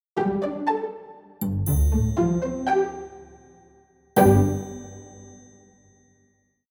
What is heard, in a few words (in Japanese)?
ジングル